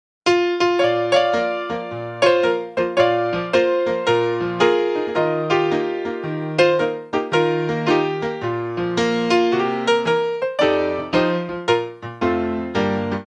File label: Gospel Piano